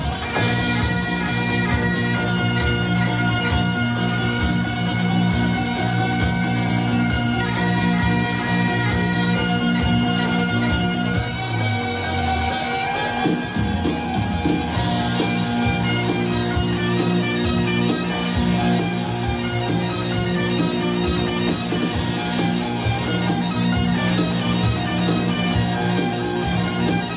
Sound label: bagpipes